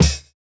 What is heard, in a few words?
Kick, jungle breaks drum sounds